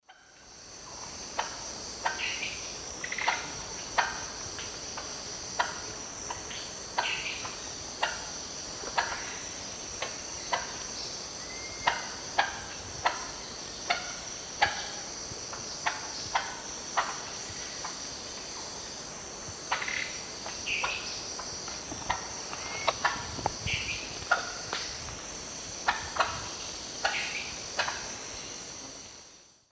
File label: Psarocolius yuracares, calls; S. America; Eastern Lowlands